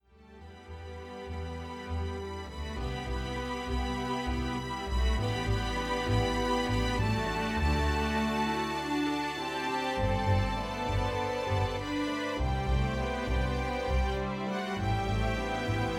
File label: ODE TO SIBELIUS for String Orchestra and piano